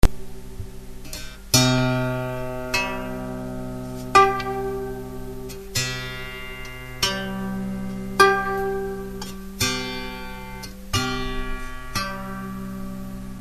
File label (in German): grund quint okt, musik